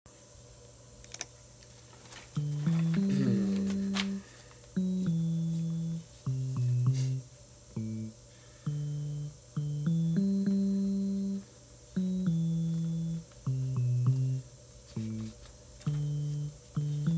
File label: Soprano; Hear Us